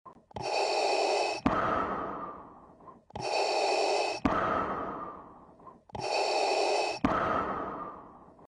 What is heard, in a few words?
darth vader breathing sound effect